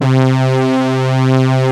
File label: SYNTH BRASS, ROLAND; USB Soundscan vol.49 - Analog Fantasies; AKAI